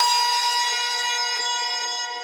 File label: synth main